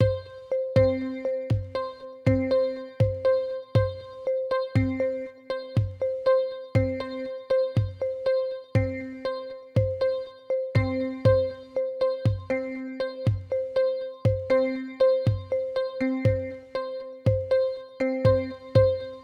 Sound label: percussion bounce